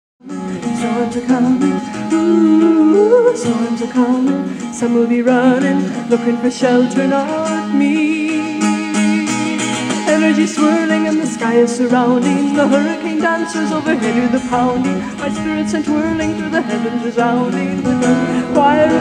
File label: Storm Song, choir